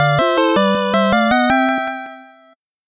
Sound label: 8bit jingle normal